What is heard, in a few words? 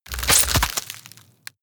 rip bone and flesh short